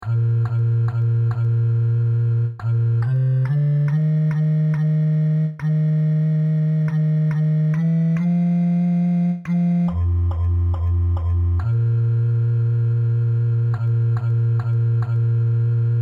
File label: Bass